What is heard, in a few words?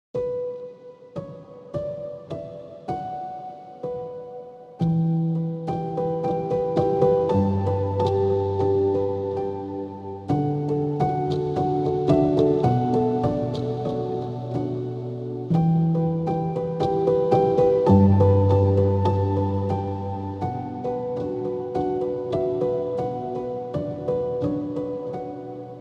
calm waves soft piano; Château Montbéliard Wurtemberg; L’histoire du musée